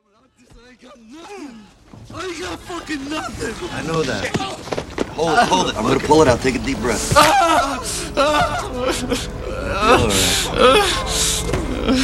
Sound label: piano